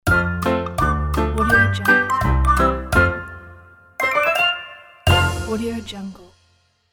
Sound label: perky upbeat musical conclusion; transitions and movement